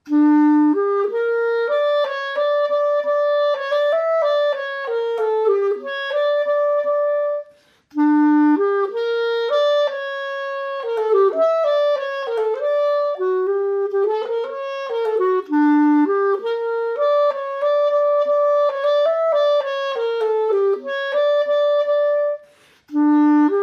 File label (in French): Dobranoc synthetique